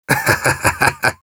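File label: laugh short, Russian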